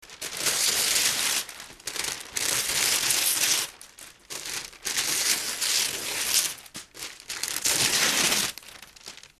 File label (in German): zeitung zeitungsbogen; salamisound